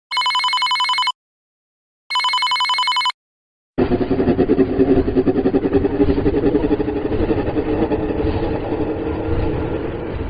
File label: horror ringtone remix devil voice; 1920 movie